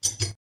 Futuristic Weapons Sound Effects

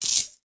chain, magic and spell sound effects

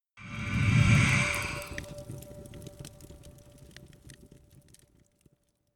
death magic

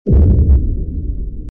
explode; Heavy Weapons And Explosions Sound Effects

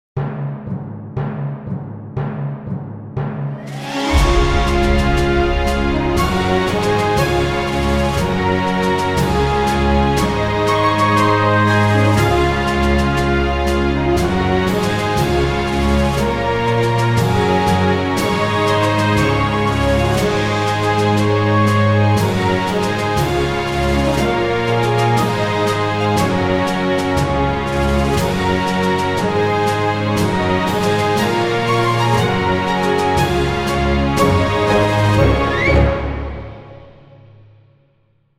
fanfare, Classical

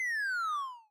fall; Retro Game Weapons Sound Effects

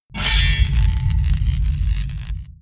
heat weapon, Medieval Weapons Sound Effects

